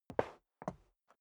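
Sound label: step dress boots concrete scuff, footsteps; schritte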